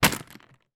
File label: sweets bag drop down on table; skittles